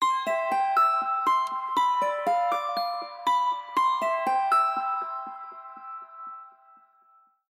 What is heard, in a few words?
Good Night, alarms; Meizu MX2